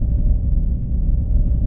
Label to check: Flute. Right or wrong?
Wrong